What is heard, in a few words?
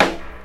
snare one shot g key; snare drum samples